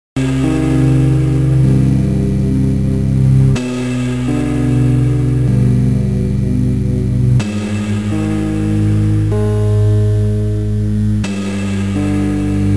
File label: Calm Ambience; Max Payne; gba